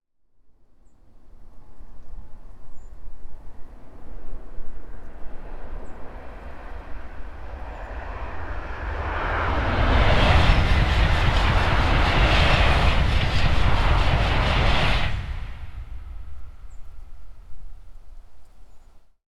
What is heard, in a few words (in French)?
train tgv passage avant un pont